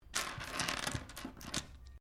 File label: Dice Small Laminate Table Pickup